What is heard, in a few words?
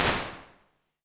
1.0s Short Ramp, Impulse Responses; Small Spaces; Indoor Spaces; Apple